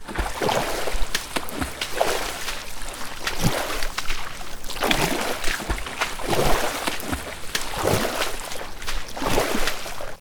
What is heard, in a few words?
Swimming